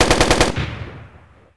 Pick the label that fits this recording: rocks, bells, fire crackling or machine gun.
machine gun